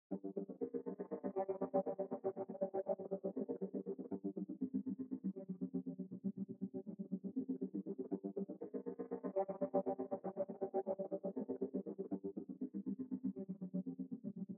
Guitars; Risk of Rain 2; Engineer Edition 2

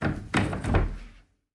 Shutter rattle; The 39 Steps

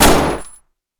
HK MP7

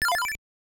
Small enemy destroyed; Gradius; MSX

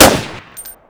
HK XM8

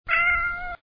Cat Meow

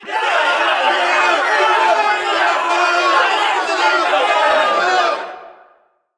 Crowd Angry

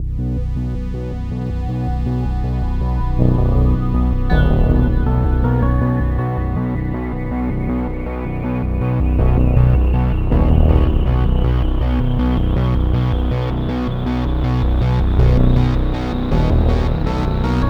tension rising; the warriors 2005 ps2

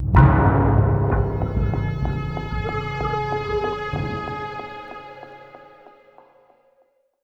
Stinger

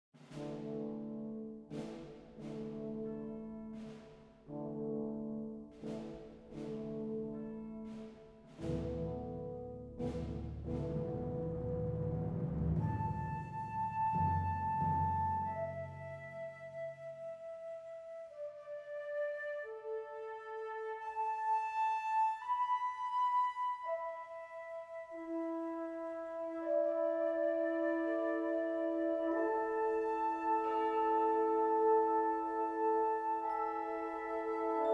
World Music

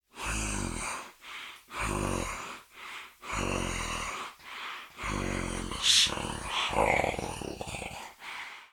walk; guns n zombies 2014 pc

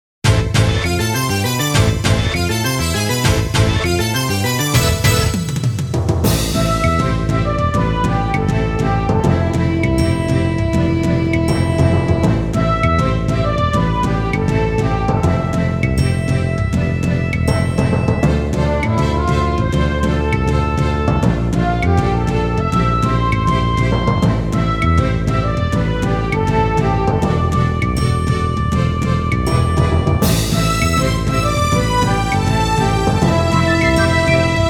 Battle; arc the lad iii original game soundtrack

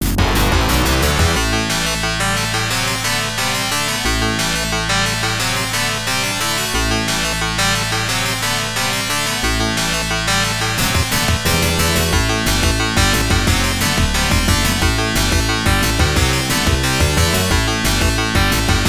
Score Ranking; Air Duel; arcade

